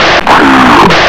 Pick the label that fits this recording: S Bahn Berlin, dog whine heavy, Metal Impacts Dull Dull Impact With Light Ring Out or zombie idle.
zombie idle